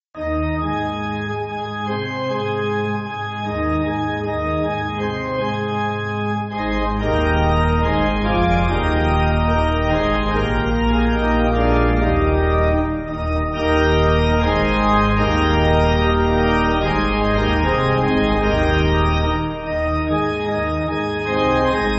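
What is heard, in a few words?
Pipe Organ, church backing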